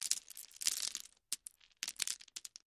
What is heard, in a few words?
Landscape Rock On Con, rocks & dirt